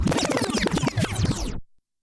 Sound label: dj scratch